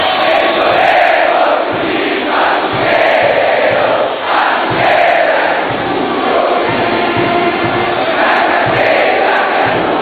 Bogota Himno

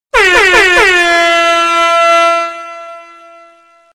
Bocina Regetonera

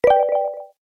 notificacion; Nexus 5

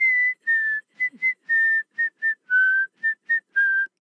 silbido; Maeve

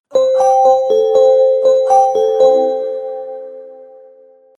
TRanvia

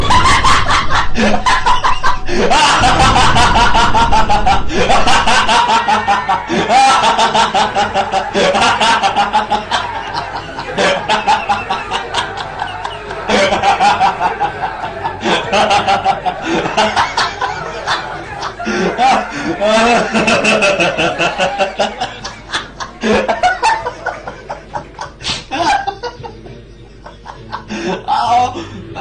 Risa Muy Contagiosa

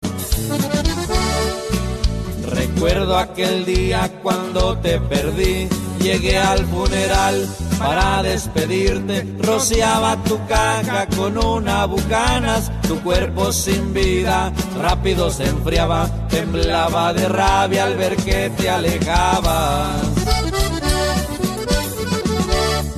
Corrido

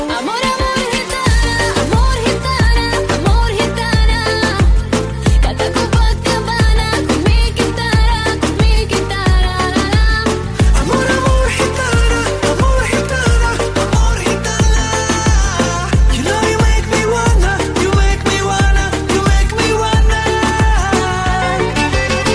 Gitana

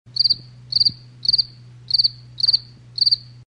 Grillo

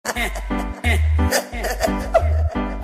Risa; De Engo Flow

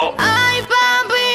Que Buena Gritada

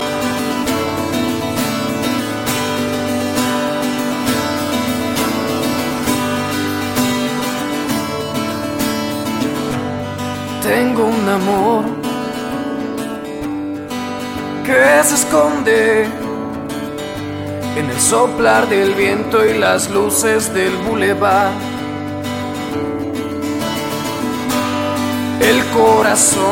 La Acustica; Agape

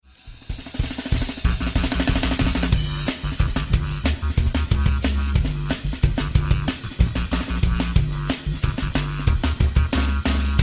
Bateria; Jojo Mayer

